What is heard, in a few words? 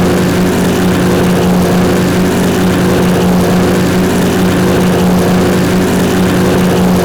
R2800 F4U; engine; hikouon; engine sounds